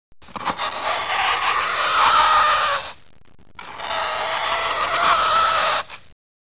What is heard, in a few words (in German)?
tafel kratzen